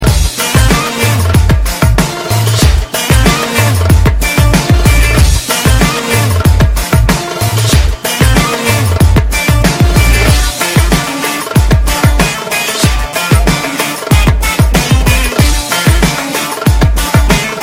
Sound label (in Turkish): MUZİK; recep ivedik